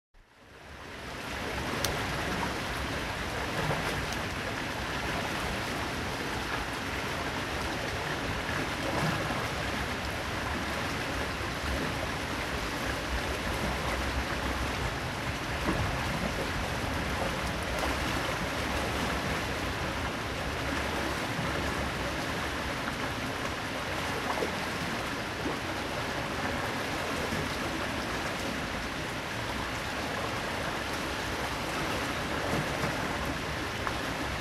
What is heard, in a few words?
Rain Water, ambience